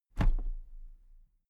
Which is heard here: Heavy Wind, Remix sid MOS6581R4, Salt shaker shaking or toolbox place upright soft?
toolbox place upright soft